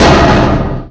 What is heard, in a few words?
bounce; VM Viper